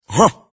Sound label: grunt sound; rick ross